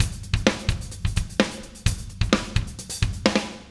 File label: 129 16Th Rock Drums Verse, Fast Tempo; Pop Rock; Digidesign; Pro Tools; Pro Tools Essential Loops